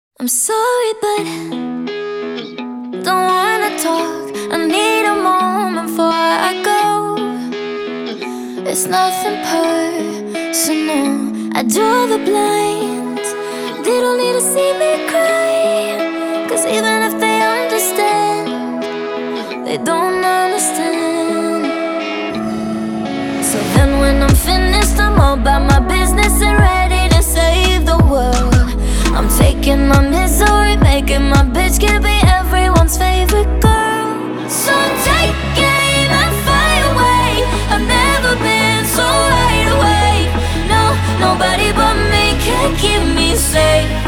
music

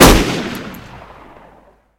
sniper

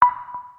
countdown

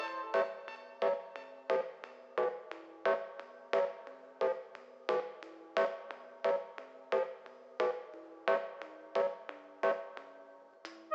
LOOP; deathbyai

